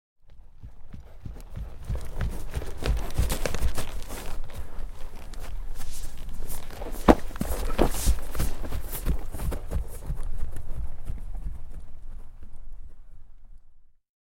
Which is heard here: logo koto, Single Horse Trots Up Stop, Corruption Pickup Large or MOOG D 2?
Single Horse Trots Up Stop